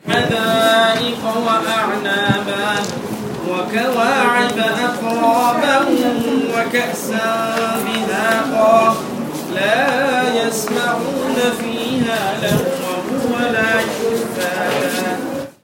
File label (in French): Sourate soufi